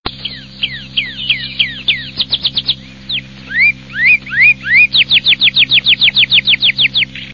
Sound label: Cardinalis cardinalis; Chordata; LandBirds